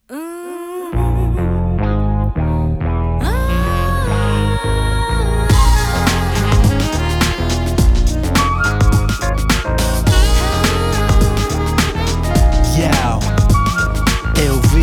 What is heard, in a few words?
Acid Jazz ver; Catherine; Catherine: Full Body